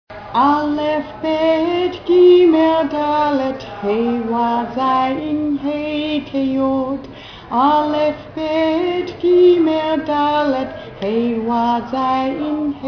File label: voca song